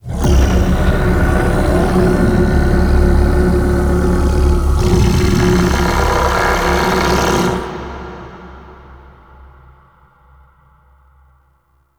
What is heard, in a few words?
Sand Vocals; Warframe; Man In The Wall